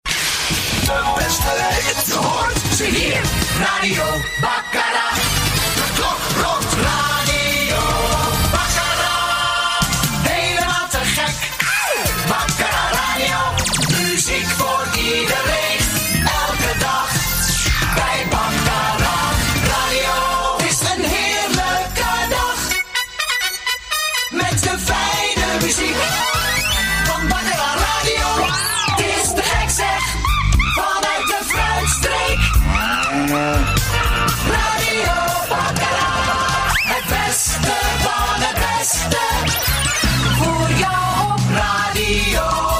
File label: jingles; Baccara Sint Truiden